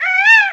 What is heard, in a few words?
parrot; gesource; creatures